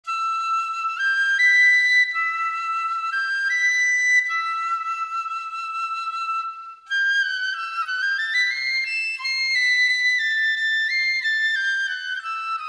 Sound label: Piccolo